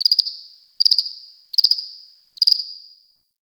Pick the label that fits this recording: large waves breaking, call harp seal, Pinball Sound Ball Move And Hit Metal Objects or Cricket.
Cricket